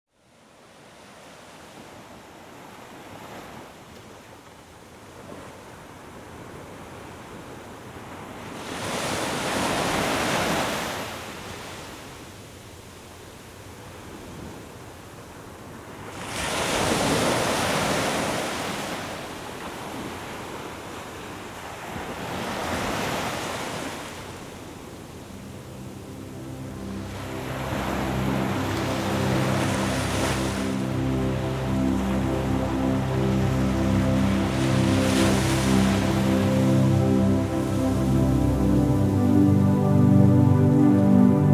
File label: Sleep Music, Ocean; Sleep Music of Insomnia with the Ocean; Sound Sleeping